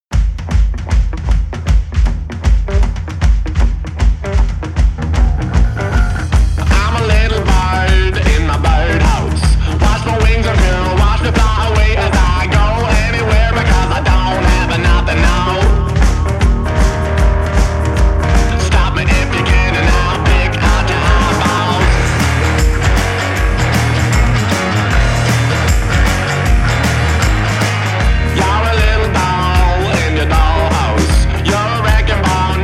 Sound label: Birdhouse Blues